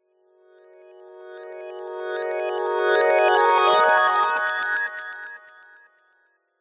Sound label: fantasy magic glissando reversed bright twinkling